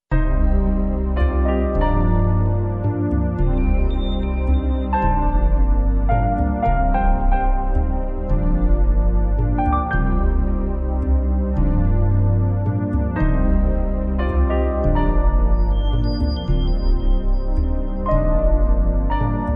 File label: Atmospheric, business music